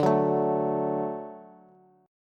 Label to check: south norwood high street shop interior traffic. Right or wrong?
Wrong